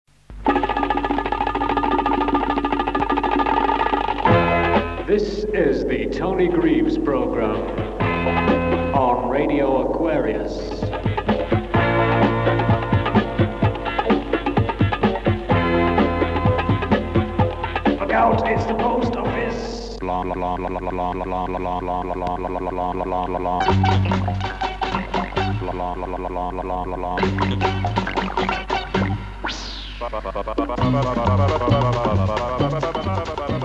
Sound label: Radio Jingles; Radio Aquarius; Mersey Pirates